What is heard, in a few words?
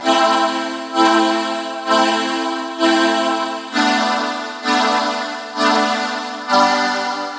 130 D Synth Air Vox; Zero-G; Future Wave